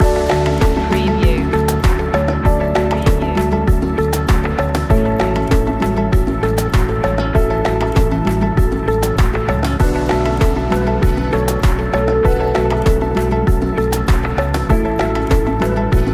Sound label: natural tendencies loop